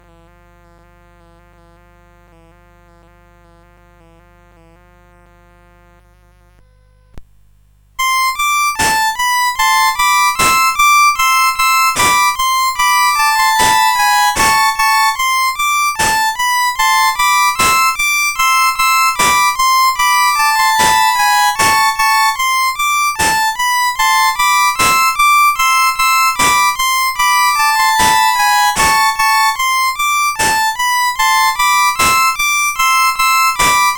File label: Courante, CSG8580